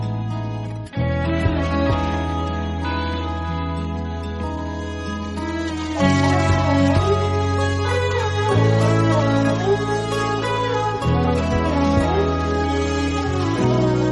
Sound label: Bgm Instrumental